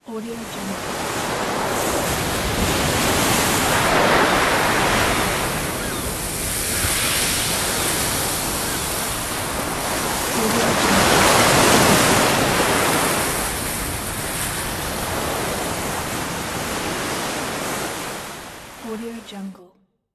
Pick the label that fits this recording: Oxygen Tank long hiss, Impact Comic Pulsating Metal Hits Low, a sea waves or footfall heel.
a sea waves